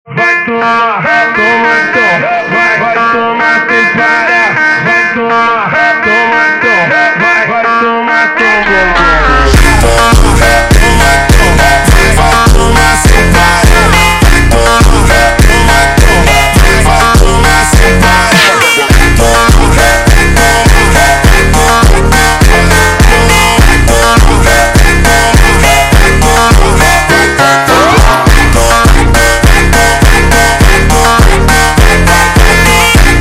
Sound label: Funk super Slowed, funk